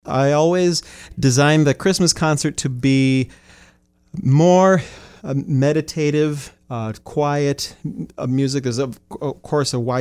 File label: Choir